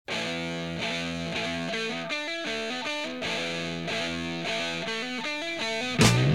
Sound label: music